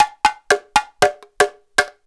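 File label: emelako grooves; Best Service; World Colours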